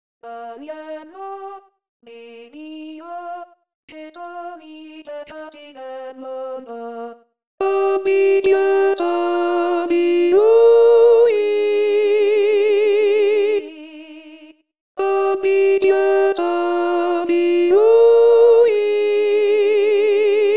Agnello di Dio Bassi; Auricchio; anno liturgico

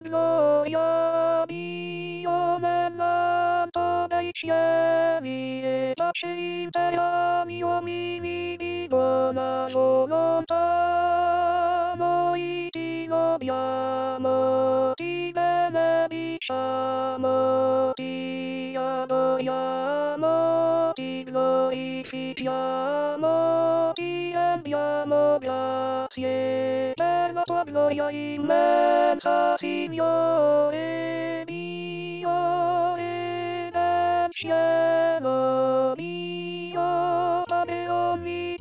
Contralti; Gloria a Dio

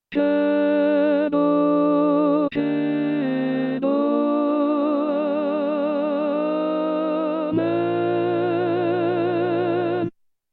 Credo Contralti; anno liturgico

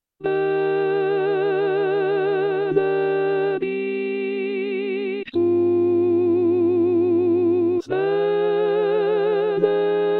contralti; Benedictus; Perosi; pasqua

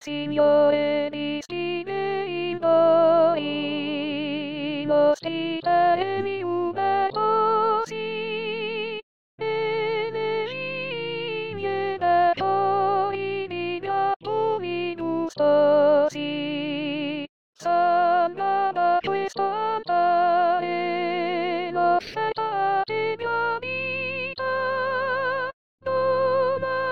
Nel Nome Di Cristo Uniti Soprani

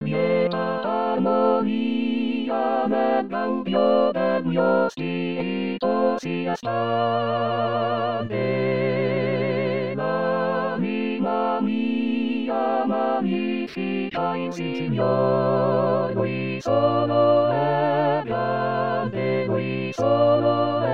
Lieta Armonia Coro

